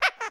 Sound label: Duck Push; Game rip; MiSide